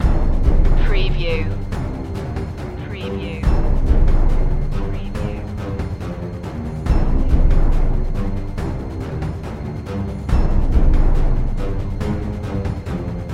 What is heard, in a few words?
underground chase loop